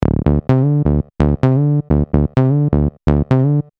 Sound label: bassline